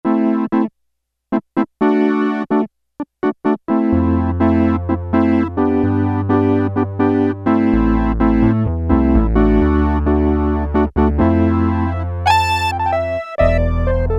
Orgiano, Roland W-30; Factory Performance Patches; Factory B

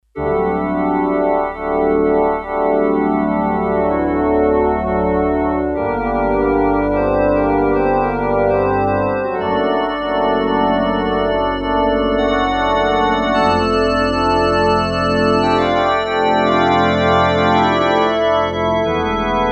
Pipe Organ, Korg DS-8; Factory Patches

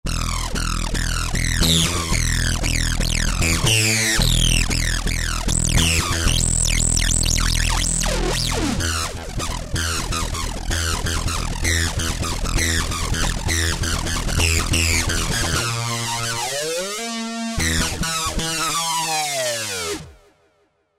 Roland JV-2080; Adrenaline

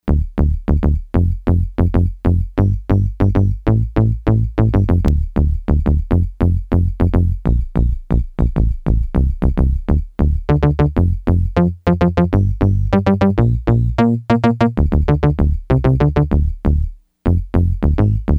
Solid Bass, Yamaha DX100; Factory Patches

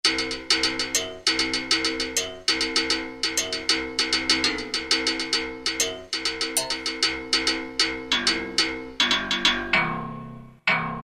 berimbau, Latin and F.X. Percussions; Roland SN-U110-02

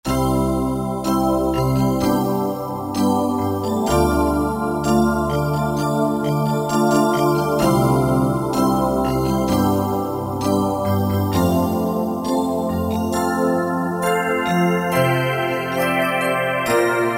Sweet Heaven, choir & pad; Factory Voices

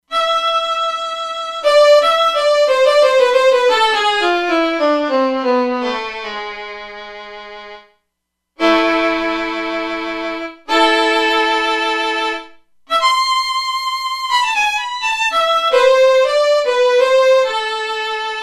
Solo Violin; Factory Presets; E-mu Proteus-2

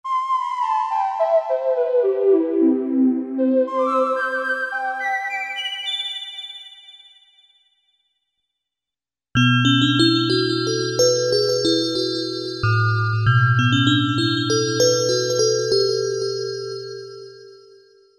SYN K-2000; Best Service Gigapack

